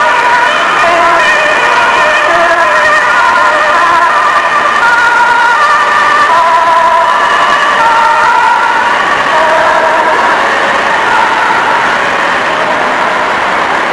Ambulance

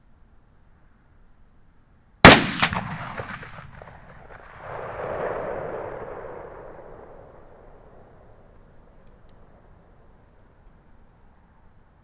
Gunshot

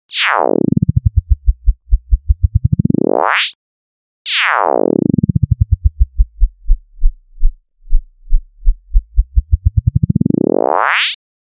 Sweep Of Pulses

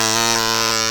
Kazoo do

